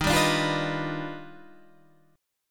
D sharp Augmented Major 9th D sharp Guitar Standard; strummed; 11,10,12,10,12,11; JGuitar